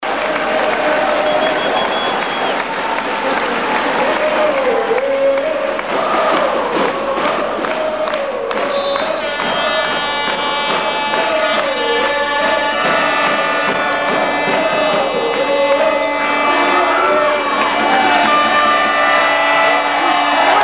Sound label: ohohohoh